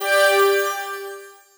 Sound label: goal; MarbleMarcher